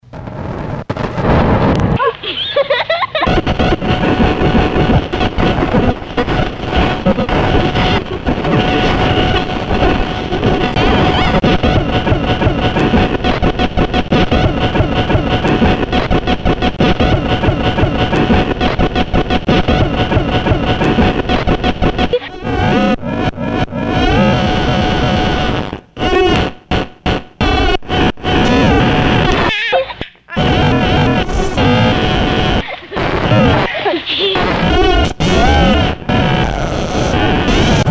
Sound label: CD player, Circuit Bent